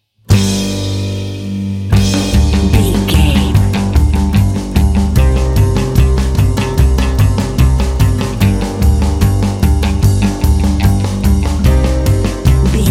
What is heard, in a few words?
polka crazy fun hour bed